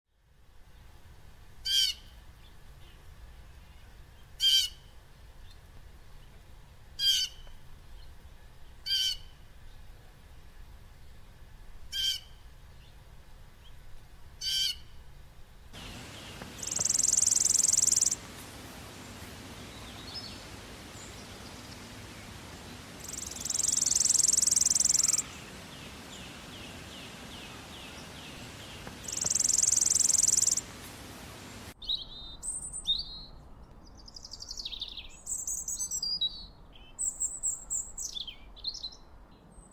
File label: Different Bird Sounds